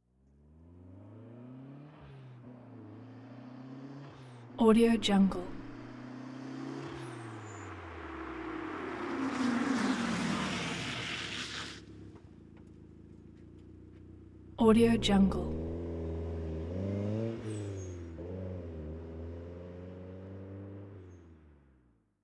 turbo racing car moderate approach skid stop and away